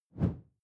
whoosh simple slash big low; swooshes-swishes

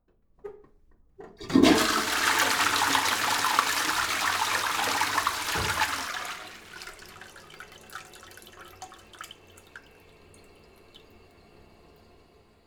toilet flush long, badezimmer

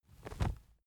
wing flap leather single; natur tiere; voegel

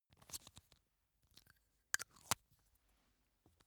makeup box handling; haus-garten; badezimmer

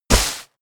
step walk grass

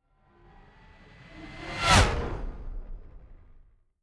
fly by hover car hum high

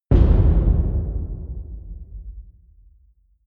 wood hit large table

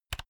insert usb laptop unsteady, elektronik; objekte

